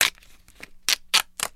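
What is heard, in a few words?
Perforated Paper Tear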